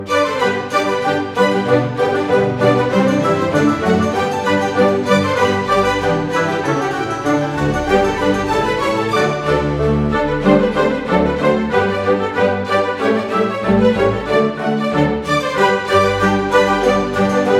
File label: baroque coffee house ringtone